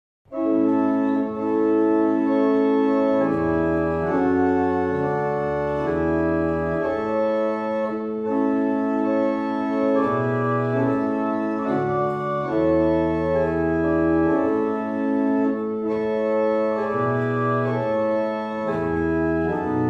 barrel organs; Brightwell

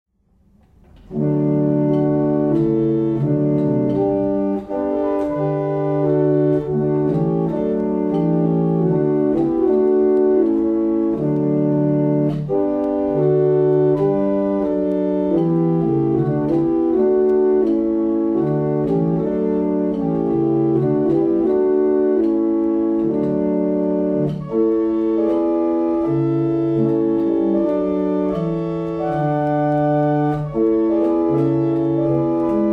Easter Hymn, barrel organ; Lillington

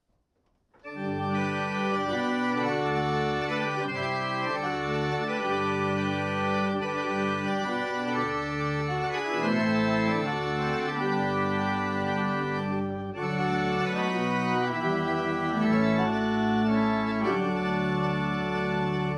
German Hymn, barrel organs; Quantoxhead

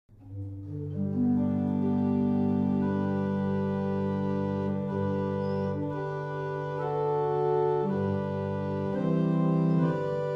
Westminster, barrel organ; Fobbing

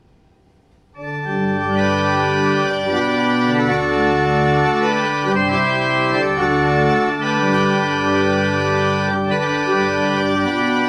German hymn, barrel organs

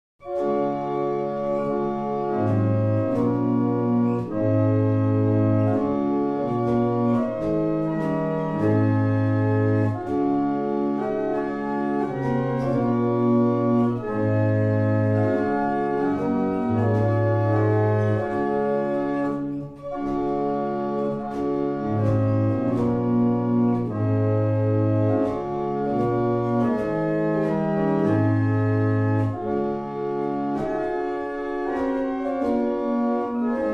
Double chant, barrel organ; Bressingham

